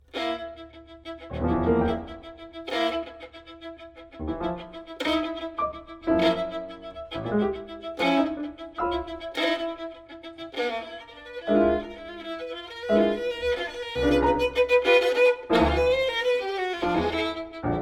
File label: Sonata for Violin and Piano - Mvt. 2. Quarter=69; Walker (George)